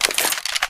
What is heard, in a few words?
sniper military deploy; weapons; source engine; left4dead2